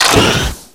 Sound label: reload